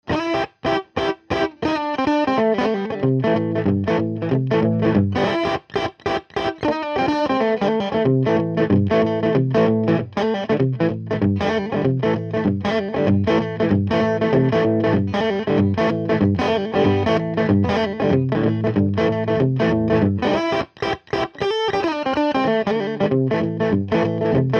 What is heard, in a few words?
Strat Eq Max